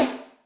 Fanta Rim, Electric Snare, Yamaha DTXpress

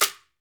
SHAK, SHAKERS; Best Service Dance Mega Drums

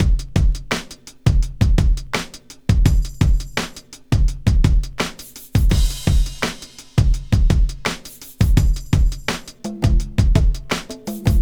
Drum Break, Trip-Hop Loops; Hard Rocks The Roof

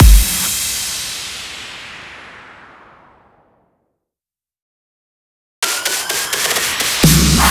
Fills 128BPM, Clubsounds

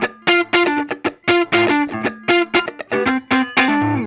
Guitar, 70s Analog Funk; Sonic Foundry (Sony Creative Software); What it Is! 70s Analog Funk

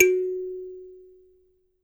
THUMB PNO, Kalimbas; E-MU Producer Series Vol. 7 – Old World Instruments

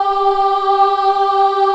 G3 child ah, choir; USB Soundscan vol.28; Acoustic & Synth; AKAI; CHILD AHF

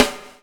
BUBL, BUBBLE; Spectrasonics - Backbeat

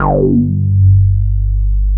G2, Bass; E-MU Producer Series Vol. 6 – Analogia Project (CD 1); Boom da Bass 2

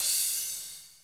SNARE HH, REGGAE; Pro-Rec - Synthsonic; REGGAE+ETHNC

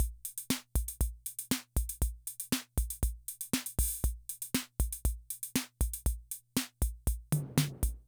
Loop, rhythm 55, classic machines

